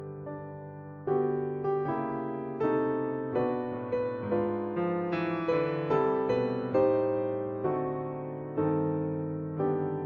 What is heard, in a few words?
o christmas tree piano ringtone